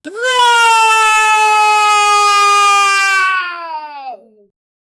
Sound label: high school villain cryin; characters